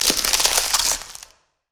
ring of frost; LoL; lissandra

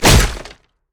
basic attack knee; rengar

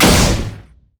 crit; LoL; lucian

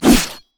basic attack claw; rengar

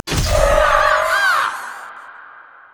dark wind; LoL; fiddlesticks

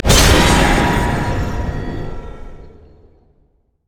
creeping death; Mordekaiser

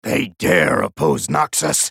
attack; Swain; en US

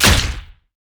basicattack dragon; LoL; shyvana

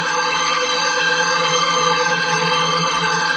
stair laser loop; odin

